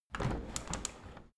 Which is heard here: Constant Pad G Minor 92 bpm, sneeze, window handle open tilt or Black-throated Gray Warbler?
window handle open tilt